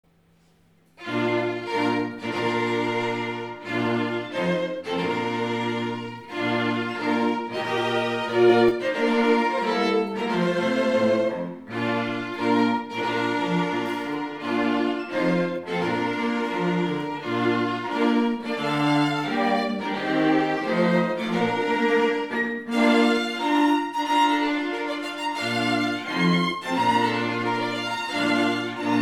Classical; Wagner

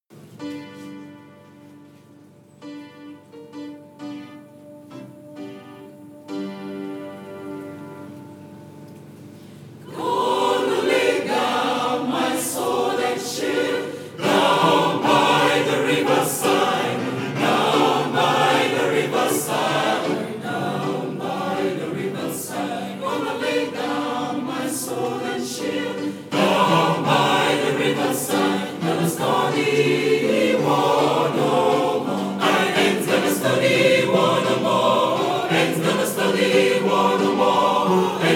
Gonna Study War no more; Harm.Wendell Whalum

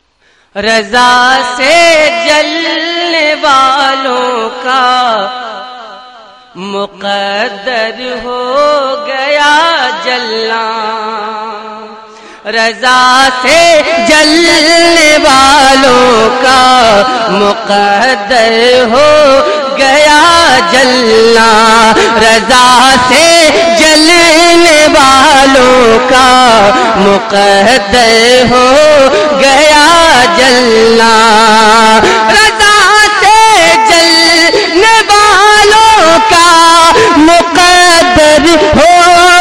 Ringtone

